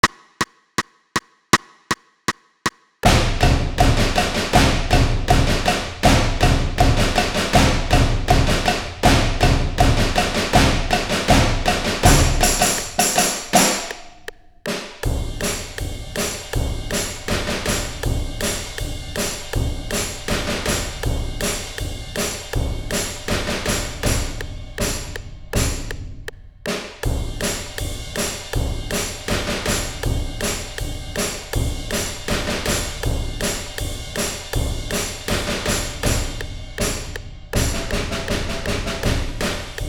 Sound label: with click